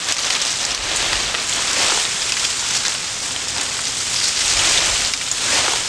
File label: roll leaves, terrains; Tony Hawk's Project 8; XBOX